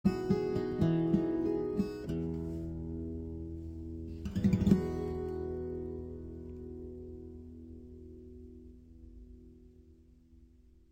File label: Hawaiian Slack Key Guitar, Away In A Manger